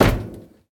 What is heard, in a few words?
metal step; Golden Crayon Sounds